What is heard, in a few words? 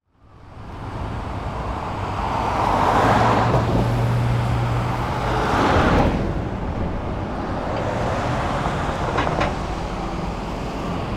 intersection; transportation